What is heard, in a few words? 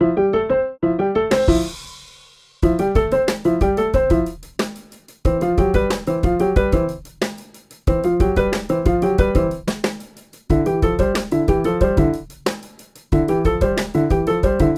Funk